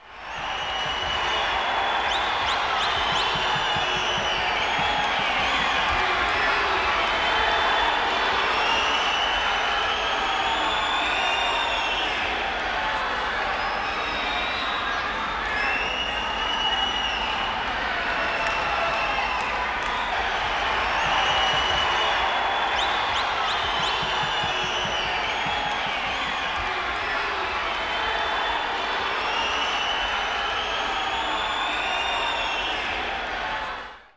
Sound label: vintage recording of a stadium football crowd cheering; sound ideas